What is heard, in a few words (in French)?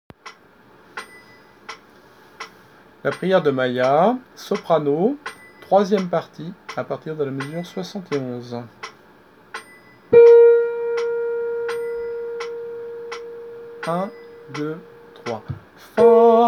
soprano